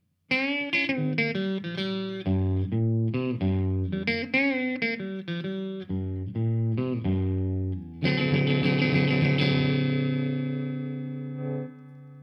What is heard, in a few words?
tele chevalet manche